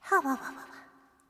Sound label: exhale